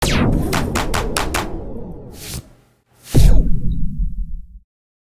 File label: girl clap; astro Legends Lyra and Erion Desktop; Foxium